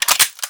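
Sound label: submachine gun Reload; Warframe; Tenno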